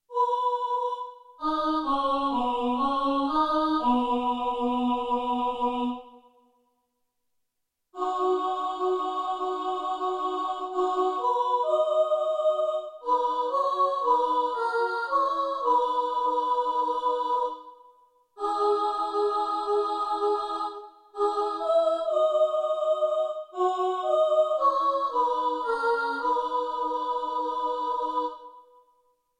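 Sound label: dawn soprano, choir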